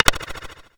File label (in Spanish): SFX No usar objeto